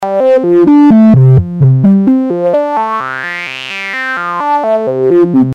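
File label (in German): Dioden-VCF, Band-Pass, Modulationsquelle jeweils LFO; modular synth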